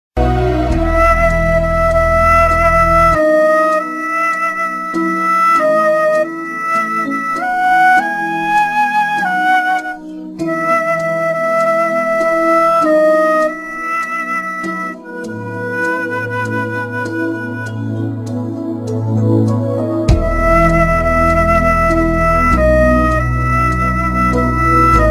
Ringtone Flute

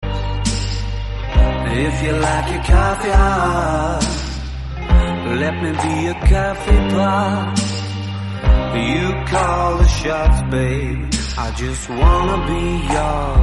English Song Ringtone

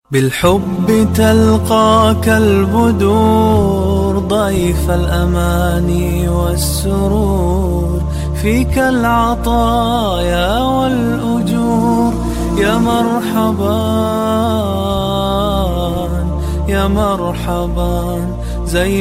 Ramadan Ringtone; ringtones

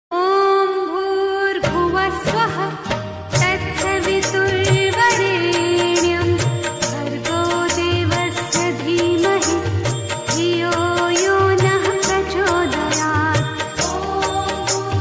Gayatri Mantra Ringtone; ringtones

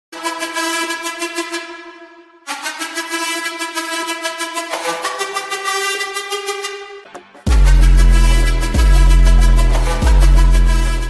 Instrumental Ringtone

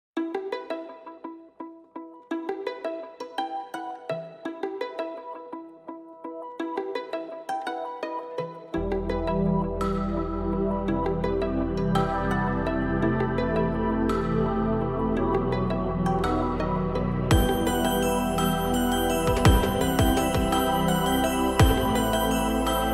Carol Of The Bells Music Ringtone

